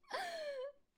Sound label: Cupid Death Vocals